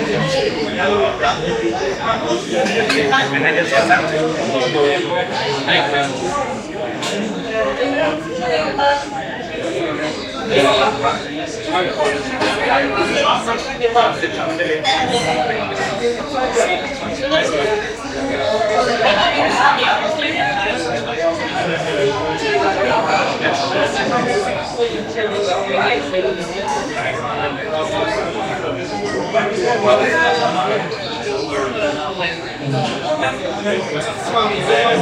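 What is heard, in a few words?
british pub ambience people, social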